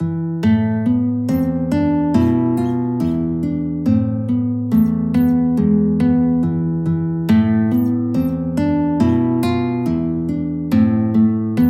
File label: guitar; the mystery of life; guitar tab